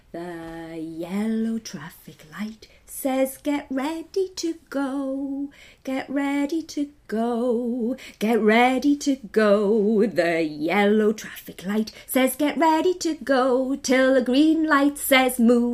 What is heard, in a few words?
Traffic light song